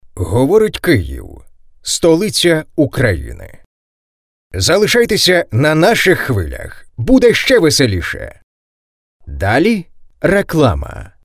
JYNGLE

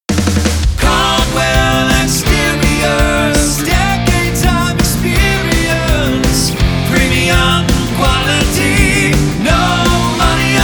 Jingle; Caldwell Exteriors

